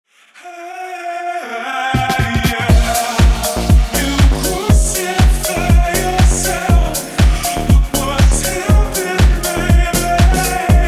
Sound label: nu disco remix